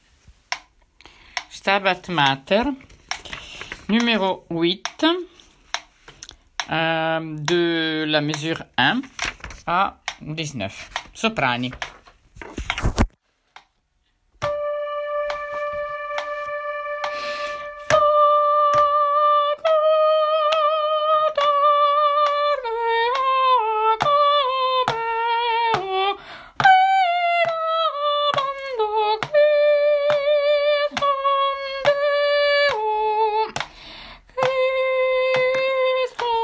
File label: Stabat soprano; Mesures 1 à 19; Stabat Mater